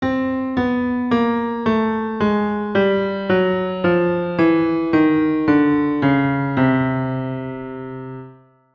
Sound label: bass